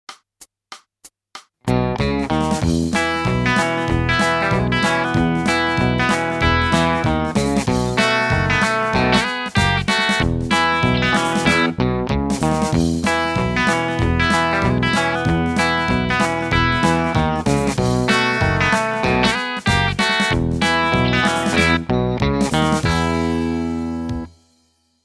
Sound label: country